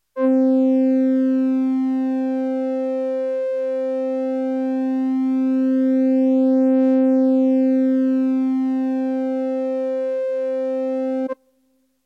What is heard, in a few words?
Diaps Mono